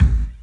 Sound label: LEDRMK, 3D Drums, LESLIE KICK